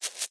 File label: Scratch; smite; kumbhakarna